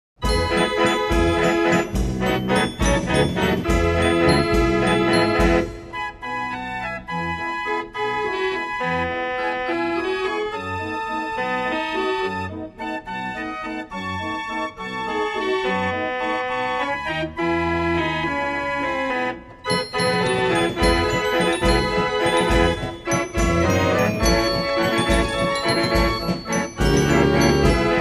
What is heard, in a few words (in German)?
orgelmelodien; 67er wrede konzertorgel